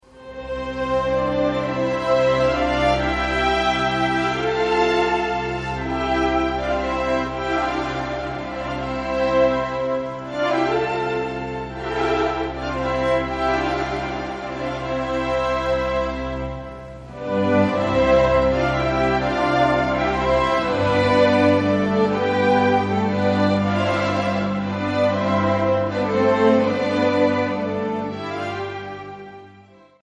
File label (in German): Hirtenmusik